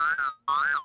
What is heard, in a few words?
vox, droids robots